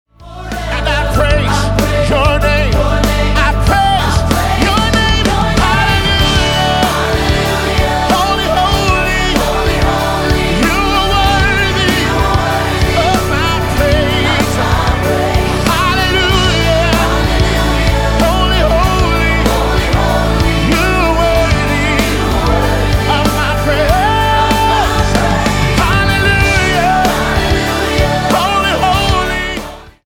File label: Hallelujah Chant